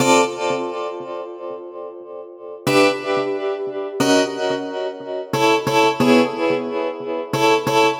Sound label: Guitar Sad